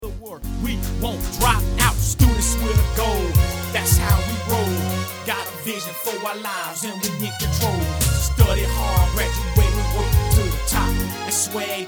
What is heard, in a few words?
Theme Music Chorus